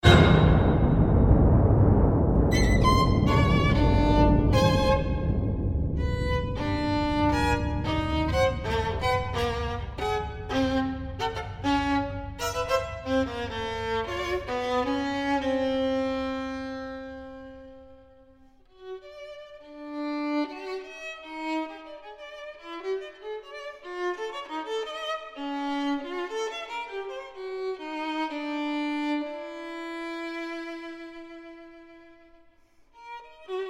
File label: VIOLIN CONCERTO